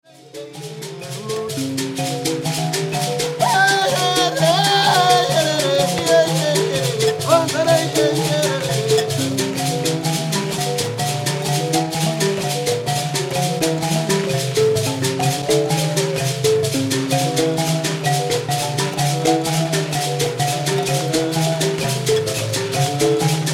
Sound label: mbira